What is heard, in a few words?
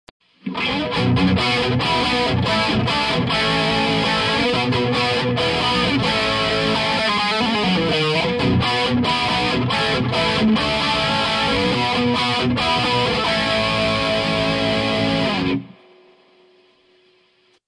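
RG100 Gain Reverb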